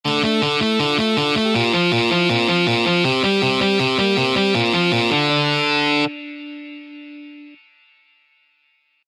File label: metal ringtone